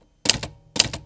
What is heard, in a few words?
Typewriter Two Strokes